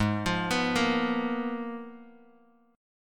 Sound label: Ab Minor 6th Add 9th, Ab, Bass Standard; arpeggiated; JGuitar